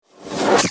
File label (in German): schuss verfremdet